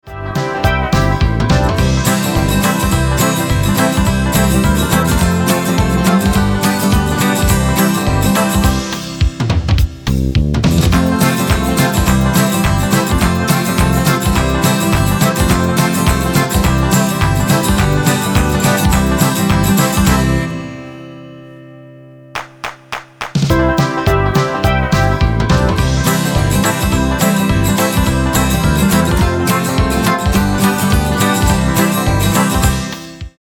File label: Karaoke